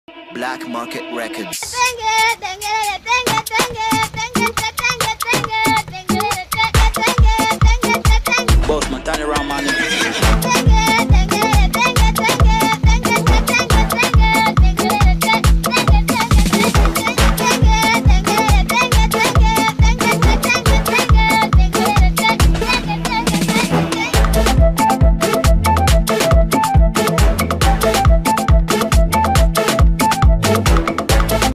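trending background music viral africas background music; instagram new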